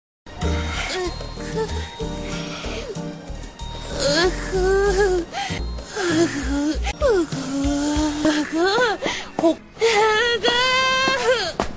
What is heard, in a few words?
uguu